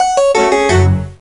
Baroque Lose; Mystery Tales: Time Travel; NDS

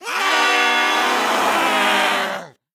Ghost Jump Scare Vocal; The Town

